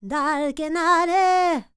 Vocal Planet World Voices, NOMADIC

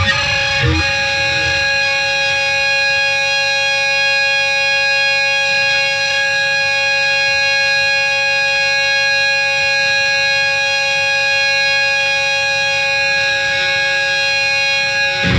SPACE GTR; Funk Guitar; Zero G - Funk Guitar

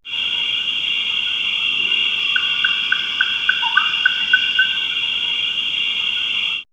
Nature, Nighttime; X-Static Goldmine

